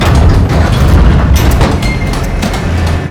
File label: dock